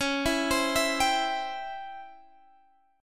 Db Minor Major 7th Flat 5th Db down Guitar Standard; DbmM7b5 arpeggiated; JGuitar